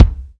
raw kick single shot C key

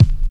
short kick drum c key, Loudest frequency: 217

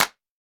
clap sound clip e key; single hits one shots

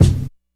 thick kick f key; kick drum samples; single hits one shots

